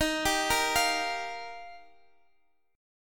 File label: Eb Major Add 9th, Guitar Half Step Down; Ebadd9 arpeggiated; JGuitar